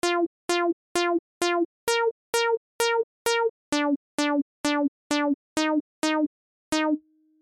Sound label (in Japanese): フィルターを閉じていく